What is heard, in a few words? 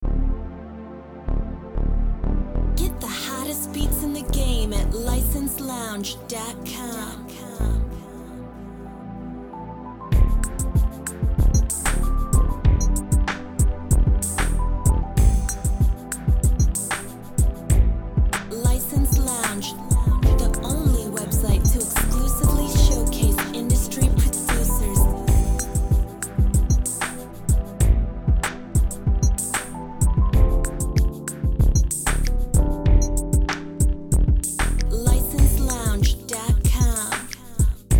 95 BPM